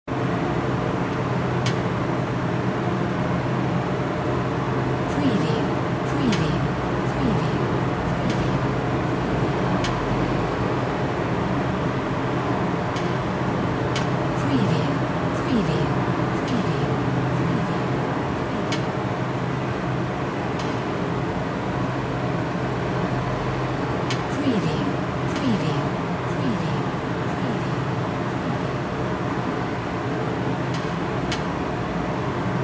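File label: AMBIENCE VENTILATION SHAFT NO FAN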